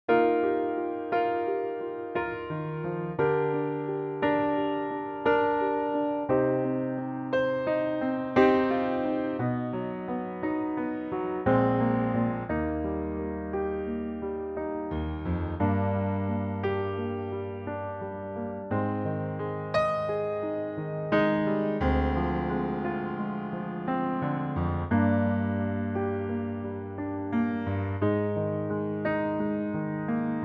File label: Eb piano; 9/8; Pianotrak; key - Eb - vocal range - G to Ab (optional Bb top note); Stunning piano only arrangement of the big song from the musical "Man From La Manc